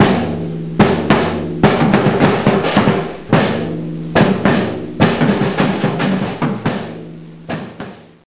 disco; Reperages 94; tortue

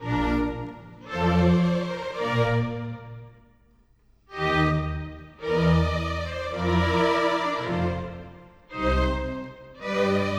Classical Strings, orchestral; orchestral 1

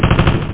distant

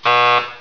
BUZZER; Instant Immersion American Sign Language - HyperSign Kids; Topics Entertainment

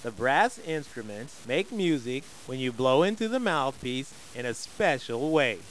brass

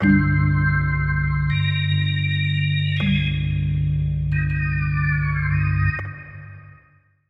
SYNTH D+B 160 C; eJay; Keys

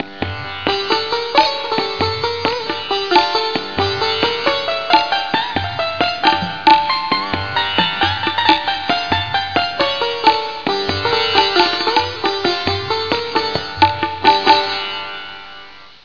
SITAR; Japan; Hitachi; india; gods

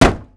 block wood; battle

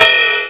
drums ride

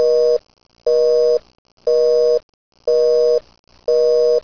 TEL DIAL; Talking E-Mail

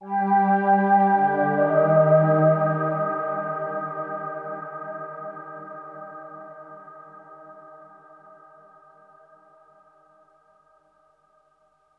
SYNTHPAD AMBNT 160 C; eJay; Ambient; Sphere

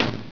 DEFLECT; STAR TREK

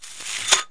SLINKY; HOUSE; FUN

